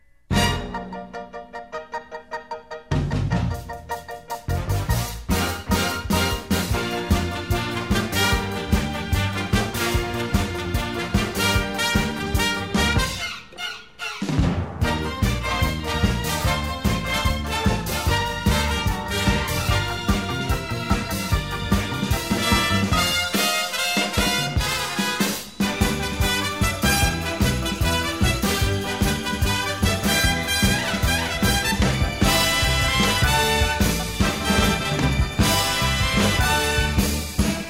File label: entre act instrumental